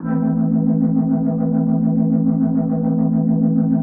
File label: DEEPHOUSE ORGAN 125 A